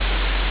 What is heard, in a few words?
ambience room tone